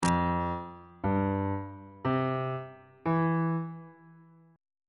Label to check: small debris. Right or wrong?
Wrong